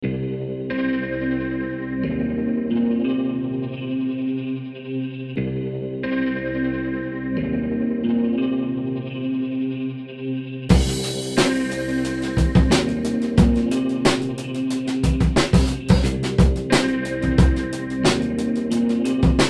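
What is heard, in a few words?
Ambient Guitar sting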